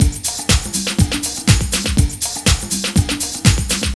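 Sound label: drum loops, right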